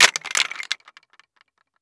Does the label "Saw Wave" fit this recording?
No